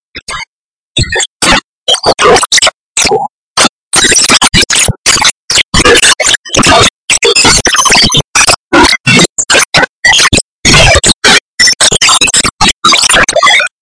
Fireplace in the forest

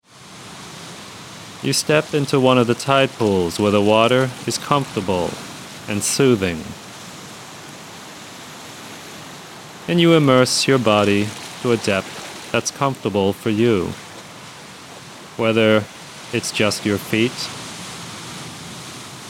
Ancient Ocean

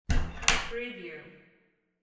glass door close

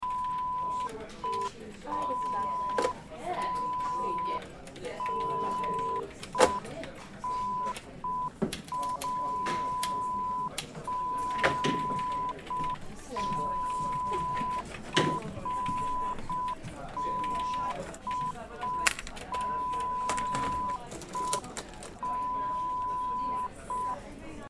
Office Atmos

